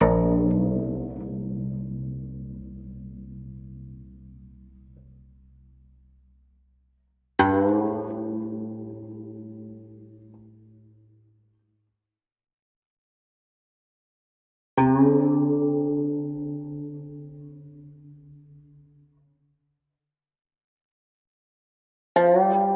Slow Diddley Bow

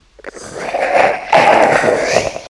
Monster Idle

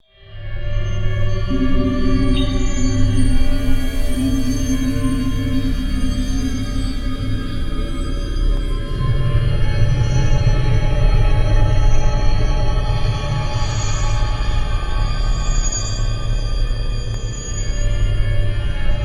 Horror Ambient Clean

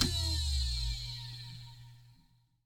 Spin Down

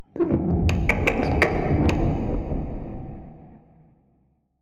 Creatures Sound Alien

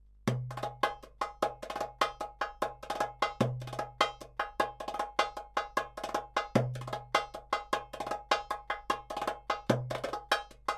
Darbuka; Sofyan

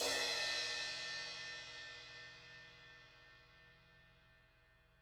Crash A Medium 17 Low Vol

